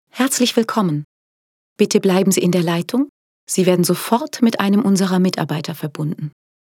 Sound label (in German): telefonpaket warteschleife